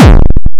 Distort, bass drum; Drum Hits

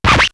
rap scratch; East West - Global Sounds

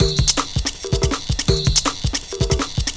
breaks 162

